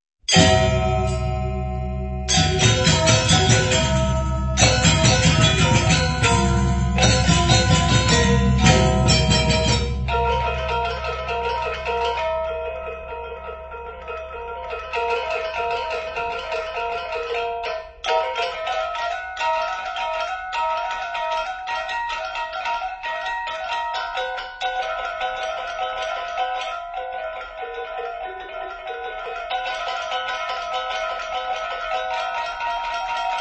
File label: World Sounds Gamelan Kebyar